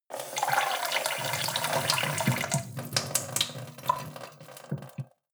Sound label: sink water pot soft; industrie-wirtschaft; gastronomie